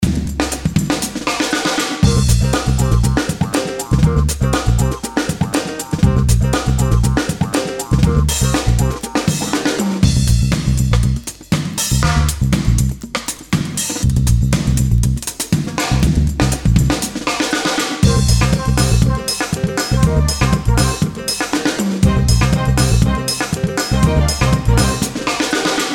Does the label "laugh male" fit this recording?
No